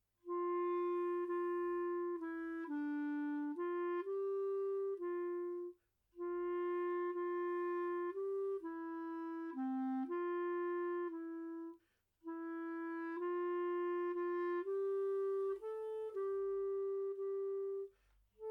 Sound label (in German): Lied Klarinette